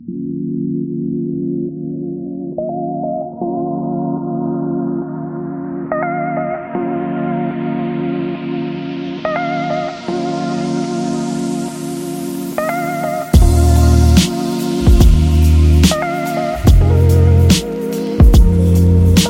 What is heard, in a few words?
Focus Beat; home tour